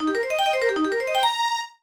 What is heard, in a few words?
Jingle C; Happy Cooking; NDS